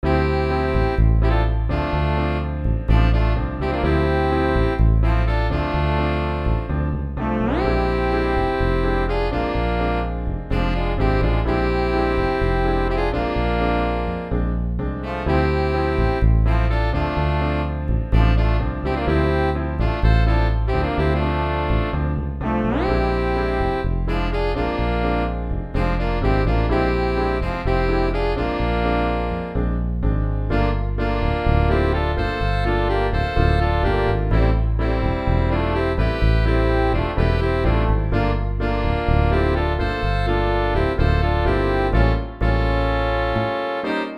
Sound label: expressive dance; bergeron guy